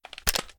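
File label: pistol raise; reloads; gmod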